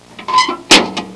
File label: MAILBOX; HAUSHALT